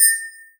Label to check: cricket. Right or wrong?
Wrong